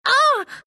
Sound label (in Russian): pain; ru